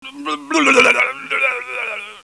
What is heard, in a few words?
Monkey Sick!; Burnin Monkey Solitaire